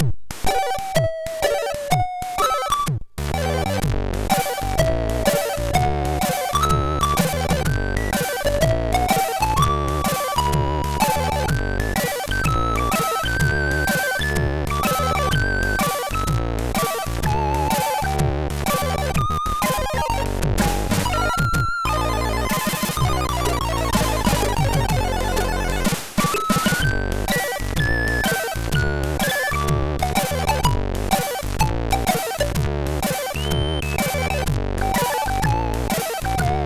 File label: sid MOS6581R3